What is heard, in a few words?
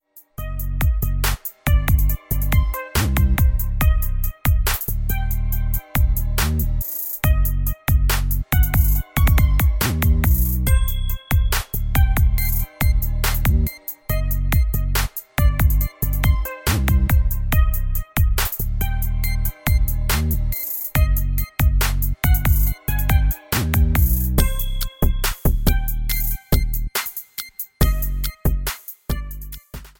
Dark Beat; MIUI12